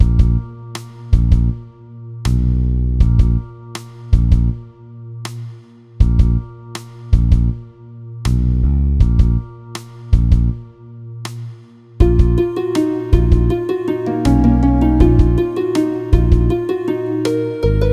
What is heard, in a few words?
Dungeon; Abandoned One